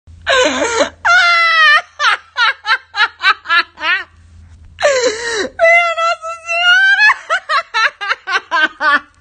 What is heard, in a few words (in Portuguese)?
risada